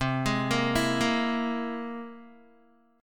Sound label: C Augmented 7th, Guitar; arpeggiated; JGuitar